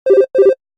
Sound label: Office Ring 2x Fast, Business Rings